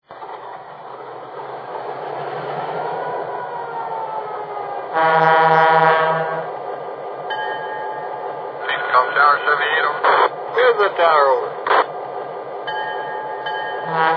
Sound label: F7, trains